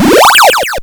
Hard PCM Chip; chiptune

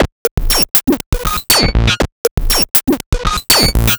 Perk Loop 120bpm 8Bit Beats; chiptune; producerloops

